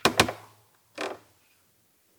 random wood creak

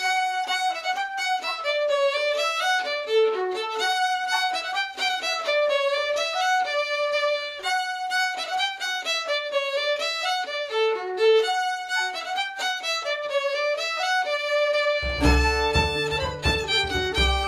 Orchestra Ambience; Assassin's Creed III

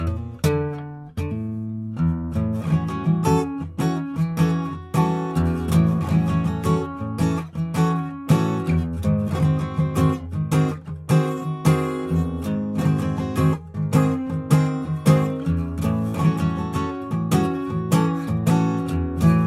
Guitar Music; Assassin's Creed III

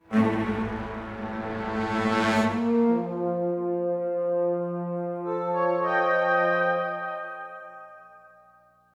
Level Sting

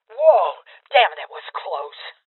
Voices Armor Shot Female Old; rebel cops 2019 gamerip

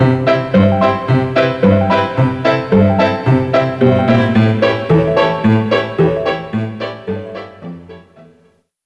Medieval Win; Tanktics 1999 PC

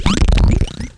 BLiNKiNG

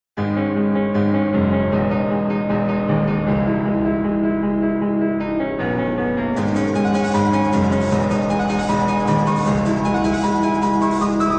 War Of The Pianos; Darkend

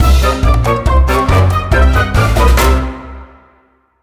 HAPPY; Buzz! Junior Dino Den; PS2

